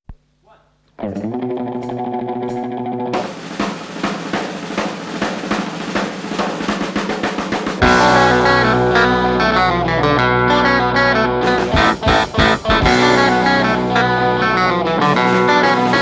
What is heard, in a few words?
Instrumental